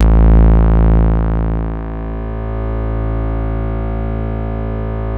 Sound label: PHAT BASE, BASSES; Masterbits; Vintage Voltage